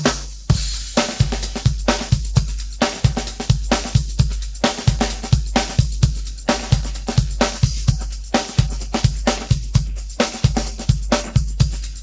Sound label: pattern, drums